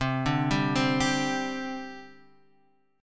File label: C Suspended 2nd, C, down, Guitar, Drop D